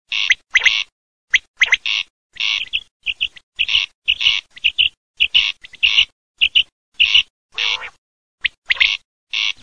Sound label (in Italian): QUAGLIA